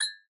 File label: blink